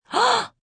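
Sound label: Gasp Inhale Female, Gasp, female, inhale & exhale (human voice); Gasping; Voice Box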